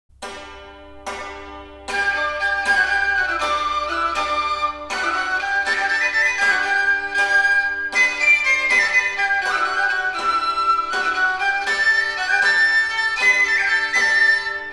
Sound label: estampie